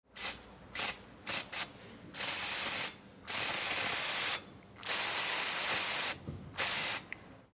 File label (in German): Druckluft abpusten